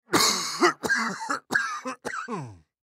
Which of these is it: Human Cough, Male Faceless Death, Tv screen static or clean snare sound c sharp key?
Human Cough